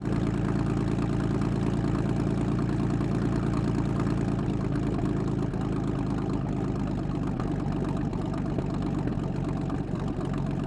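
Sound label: Comet Tank Onboard Slow Various Exhaust; A34 Model B 1944; Pole Position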